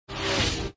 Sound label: auto; MinorityReport